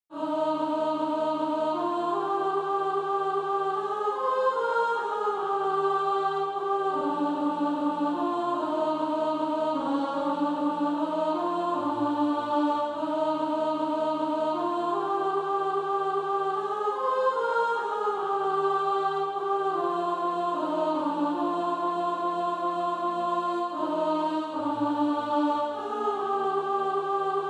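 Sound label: alto, Practice Music; SATB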